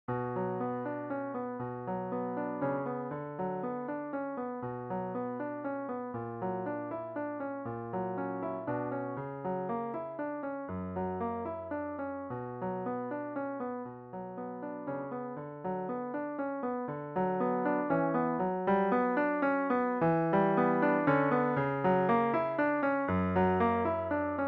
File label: Prelude b minor